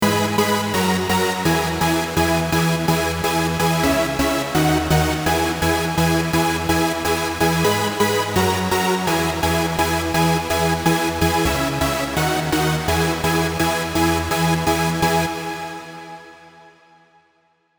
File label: dutch lead